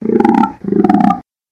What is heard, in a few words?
monster frog